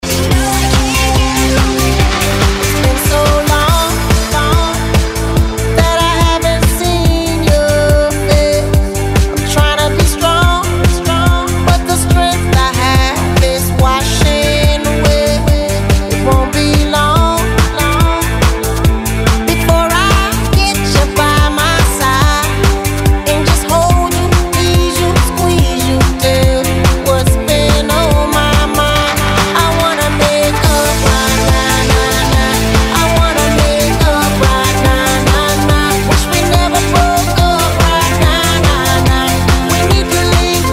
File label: electro house dance